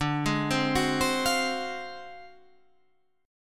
D 7th Sharp 9th Flat 5th D down Guitar Half Step Down